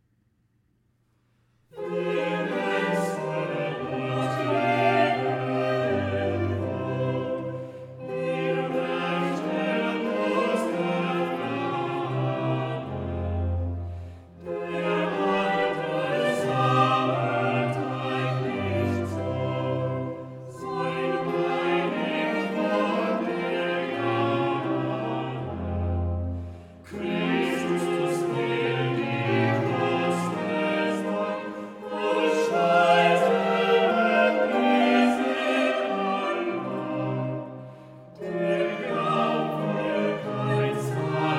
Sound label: Chorale; Christ lag in Todes Banden BWV 4; Wir essen und leben wohl; Bach